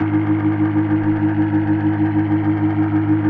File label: B3, First Call Vintage Keyboards